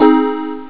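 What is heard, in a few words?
Chime Minor 6th; AMOS MUSIC 1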